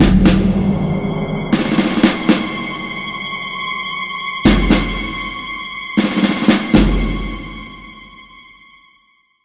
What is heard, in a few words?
Music Game Military Sting